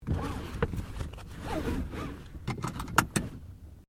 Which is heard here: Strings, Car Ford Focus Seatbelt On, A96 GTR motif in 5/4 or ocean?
Car Ford Focus Seatbelt On